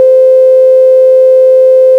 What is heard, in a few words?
chord 90